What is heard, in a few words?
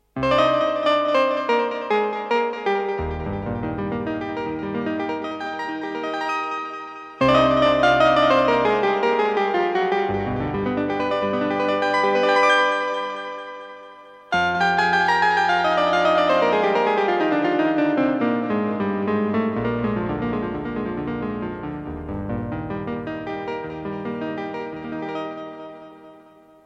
piano tone